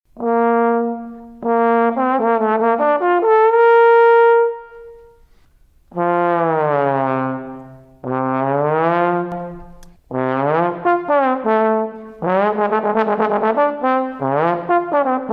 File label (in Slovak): pozauna